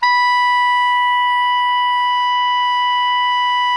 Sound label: SOP MF B, Saxophones; SOPRANO; Greytsounds; Philip Wolfe David Crozier's Saxophones